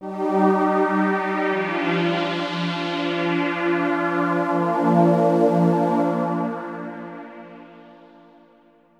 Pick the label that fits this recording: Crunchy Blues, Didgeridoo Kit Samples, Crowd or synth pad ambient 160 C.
synth pad ambient 160 C